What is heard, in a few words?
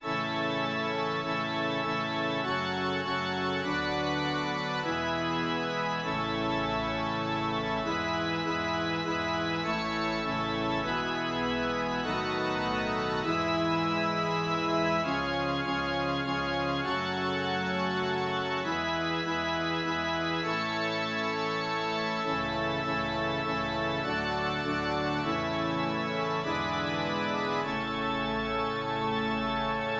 organ